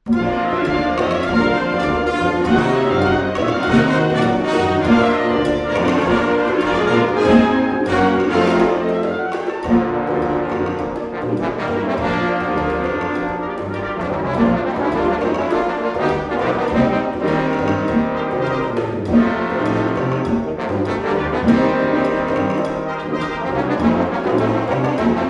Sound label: latin trombones